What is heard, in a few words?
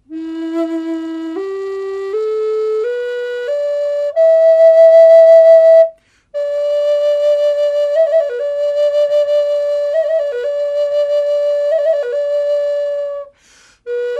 Maple Burl; Maple Burl Classic LE